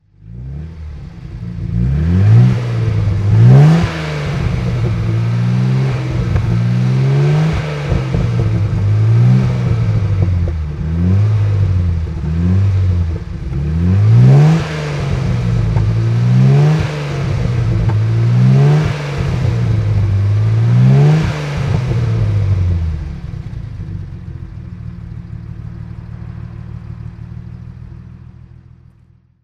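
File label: maserati sebring 3500 weber throttle, Engine sound; Maserati Sebring 3500 GT I series 2, 1965; Maserati Sebring 3500 GT I series 2, 1965 details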